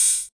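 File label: Korg KR-55 hi-hat open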